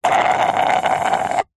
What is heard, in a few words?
food drink milkshake thick suck dregs straw slurp